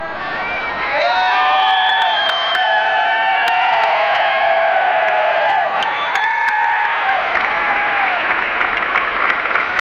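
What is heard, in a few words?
in a large stadium setting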